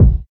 clear kick drum one shot a sharp key, Royality free kick drum single hit tuned to the A# note.; kick drum samples